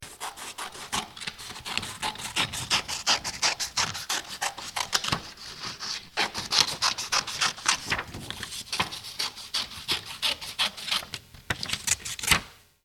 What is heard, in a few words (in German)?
Papier schneiden; geraeuschebingo